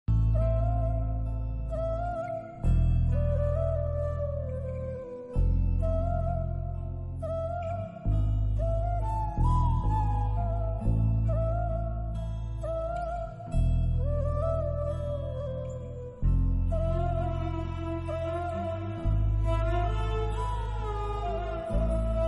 climax sad BGM love failure ringtone

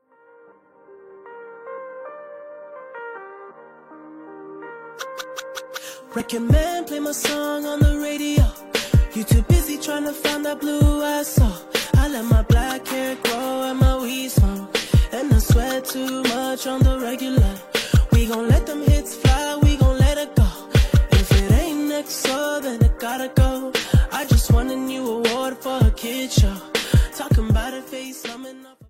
weekend reminder ringtone

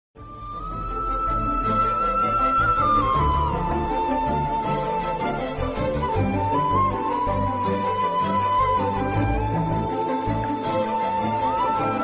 bgm ringtone; Puneeth Rajkumar

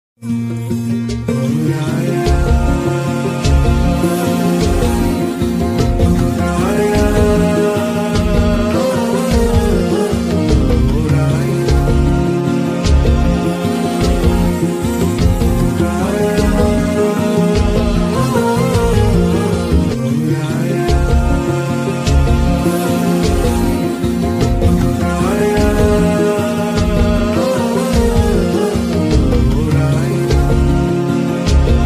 bgm ringtone tamil bgm ringtones; raayan

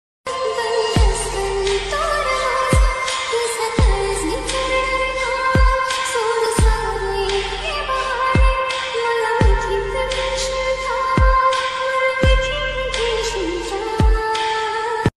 marathi love ringtone

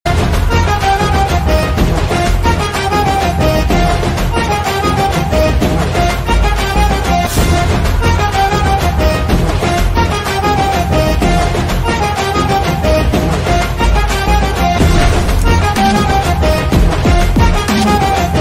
viruman bgm

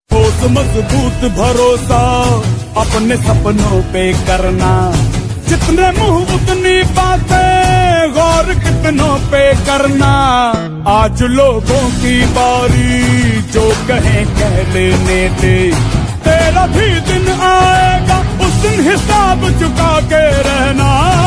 motivational ringtone

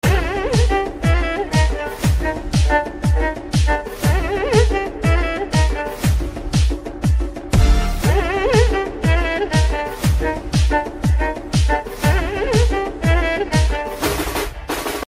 deepavali bgm ringtone telugu; diwali

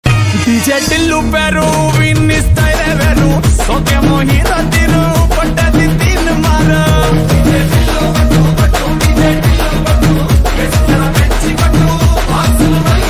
song ringtone

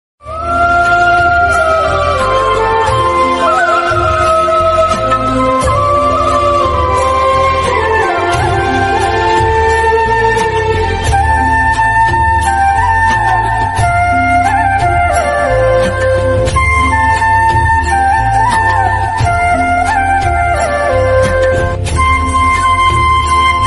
flute ringtone flute instrumental ringtone

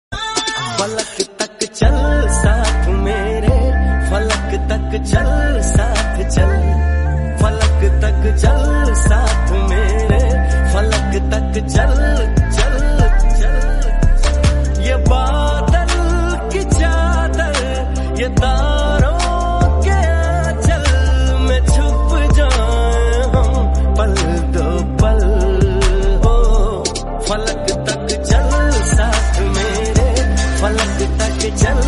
remix ringtone bgm ringtone; tashan movie

